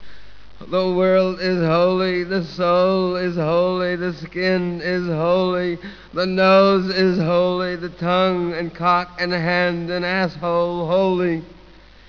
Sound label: howl